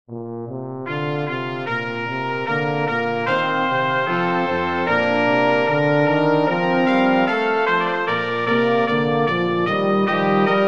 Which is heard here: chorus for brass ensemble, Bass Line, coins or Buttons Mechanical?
chorus for brass ensemble